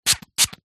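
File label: household perfume pump spray x2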